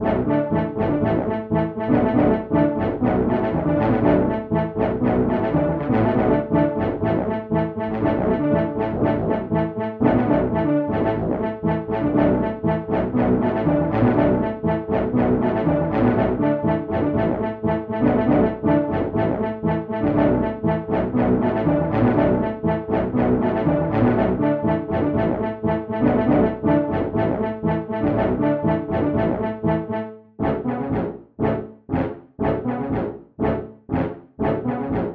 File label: tuba